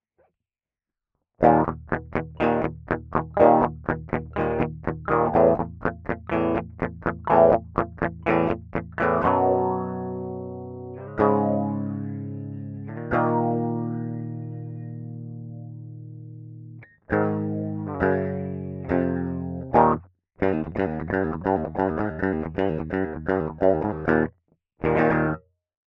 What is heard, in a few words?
DiMarzio wahwah